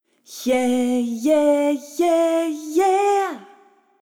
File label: Yeah B, jazz; Jazz (Джаз)